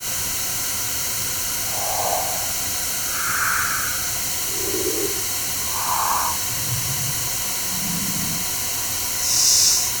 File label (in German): FIXED FILTER Eindrehen von Bändern mit weissem Rauschen; GRP Module